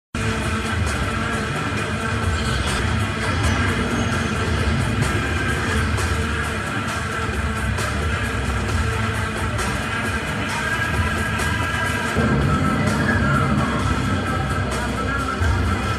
mass bgm south indian bgm telugu bgm; operation valentine; varun tej

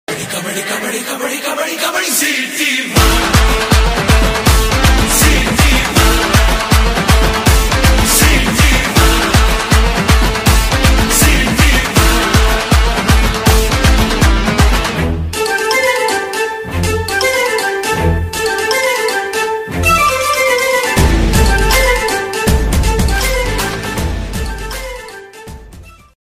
bgm instrumental ringtone 8d audio; Seetimaarr

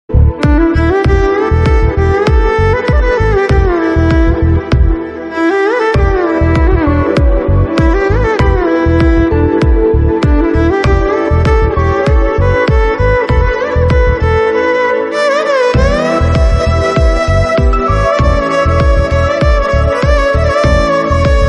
instrumental violin bgm tone violin ringtone bgm

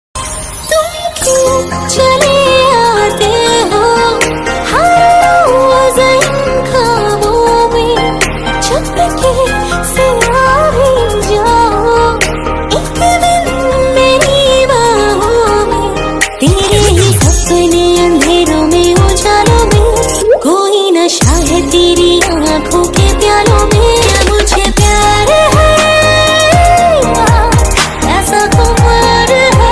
new female love sad song hindi ringtone

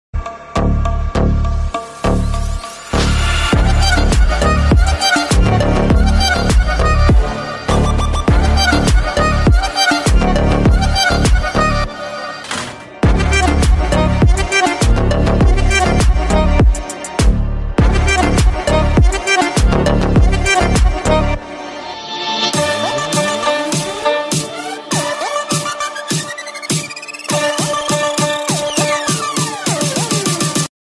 low ringtone villain beats

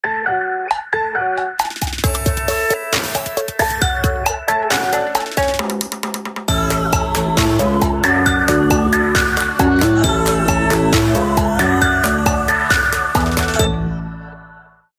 cricket wireless ringtone

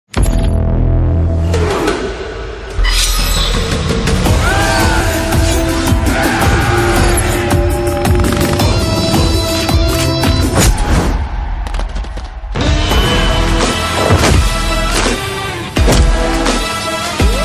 teaser bgm ringtone silent bgm; pakka commercial

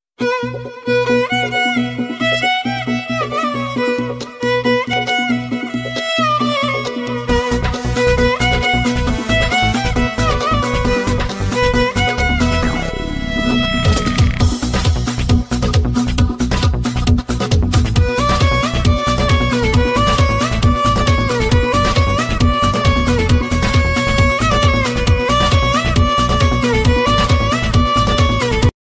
bangla ringtone

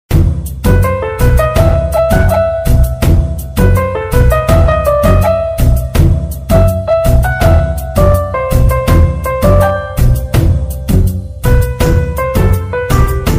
love feeling bgm ringtone; tamil ringtone

